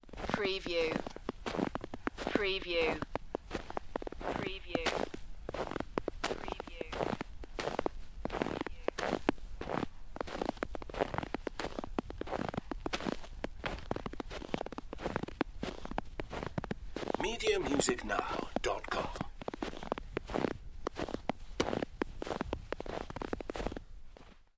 Snow and Ice, Footsteps Snow dry

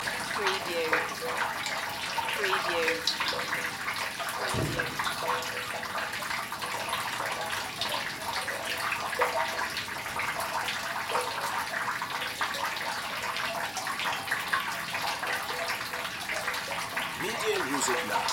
Rain Well

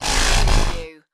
Glitch; Sound Effects Bible

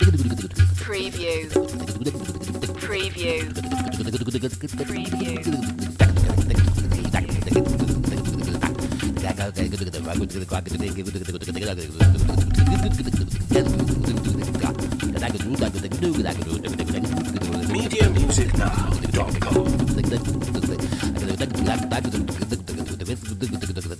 ethno scat loop

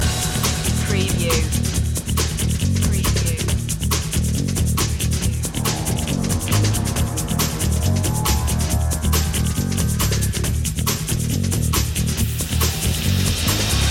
check point loop

